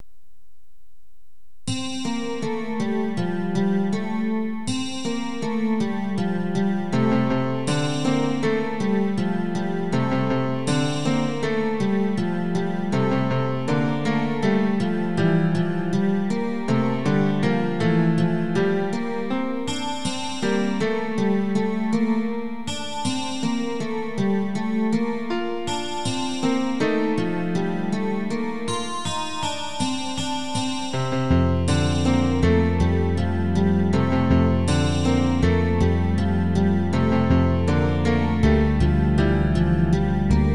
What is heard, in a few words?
rain song